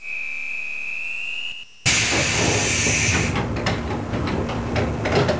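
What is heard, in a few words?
Door Close, Train; OpenBVE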